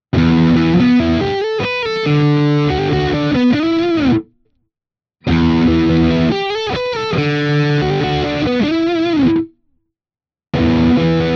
Schecter Exotic Ziricote rhythm Riff all positions